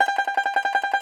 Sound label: Tenor Banjo, Plucked Strings